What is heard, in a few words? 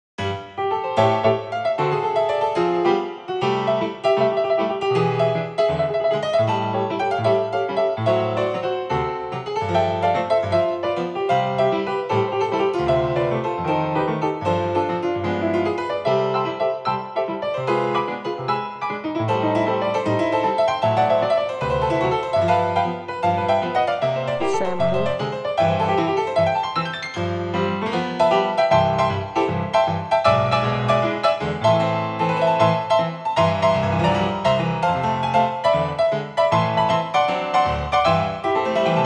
Retro Piano